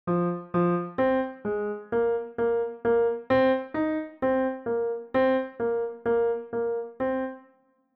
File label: Counter